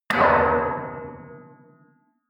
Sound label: metal impact bar bell large bright